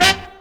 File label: HORNHIT, HORN STAB